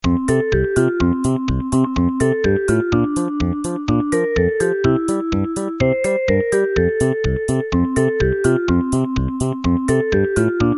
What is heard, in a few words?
jingle bells